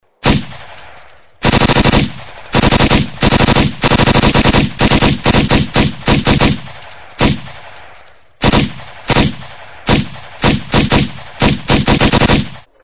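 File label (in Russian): пулемет ППШ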